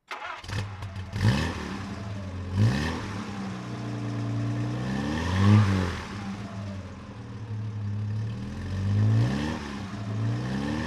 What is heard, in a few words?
jaguar S type 3.4 throttle; Jaguar S-type 3.4 Litre, 1965; Engine sound; V3D/ ClassicarImages; Marc Vorgers